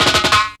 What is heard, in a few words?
Roll In It, Drum Rolls; Jungle